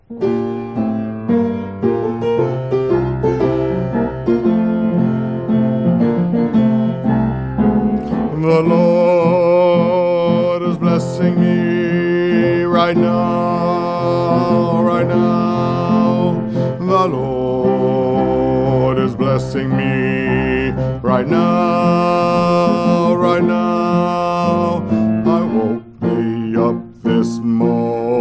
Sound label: Bass; choir